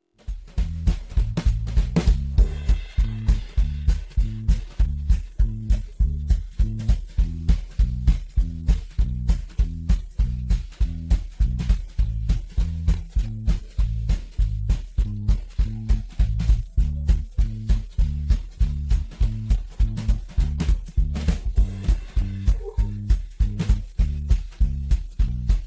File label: western swing solo